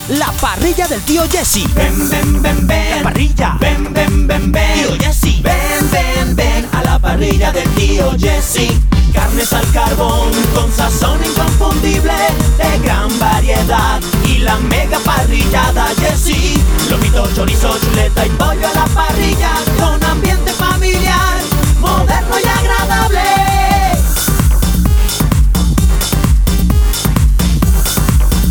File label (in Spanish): Completa sin locutor; Tio Jessy 2014